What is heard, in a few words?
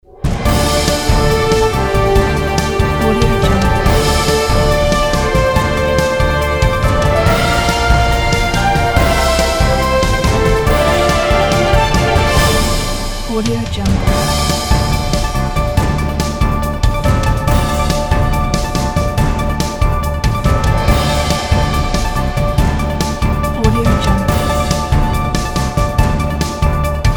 world news broadcast theme